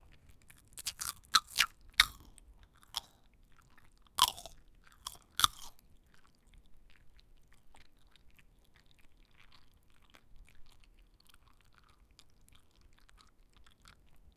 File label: gentle wet chewing sounds